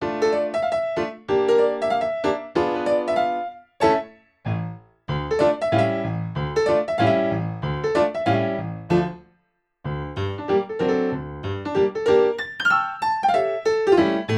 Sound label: Ragtime Thing